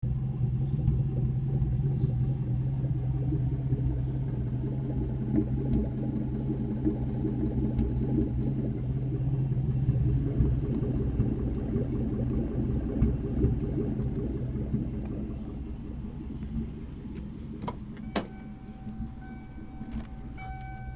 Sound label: In car idle, Cobra Factory Headers